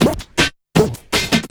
LOOP L, Drum & Bass 160 BPM

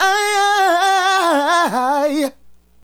Adlib, Hip-Hop and RnB Vocals

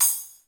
CY HI, FUNK KITS; Crypton - DrumBase5000

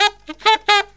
SAX; USB Soundscan vol.29; Killer Brass Riffs; AKAI

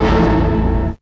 a2, Orchestra, FAIRLIGHT; ProSamples vol.55 - Retro Sampler

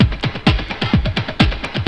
LOFI TRIBAL, DIRTY DR LPS

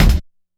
kick, Hardcore Breakbeat 134 F#min; Big Fish Audio; Hardcore Breakbeat Action; Trancefunk Mutator

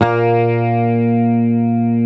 Bass, HAMER12 SUS

